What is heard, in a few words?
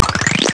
pole Up